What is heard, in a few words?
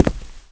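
walk left heavy, FOOT; The Elder Scrolls III Morrowind GOTY; XBOX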